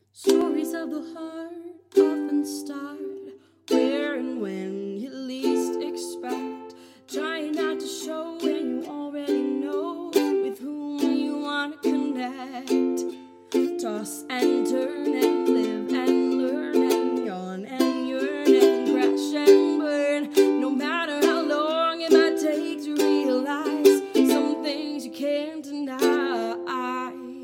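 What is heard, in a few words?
Ukulele Transcription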